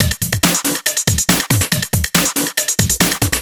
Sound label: Break Beats, 140 BPM